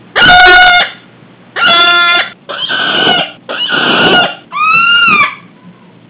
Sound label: scary